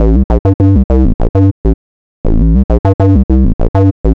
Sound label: bass staccato